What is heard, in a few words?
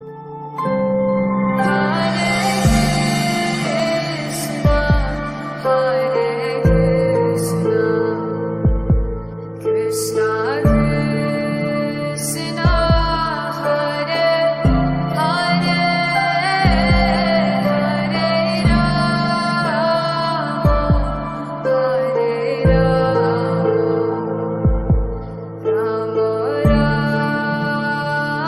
Hare Krishna Lofi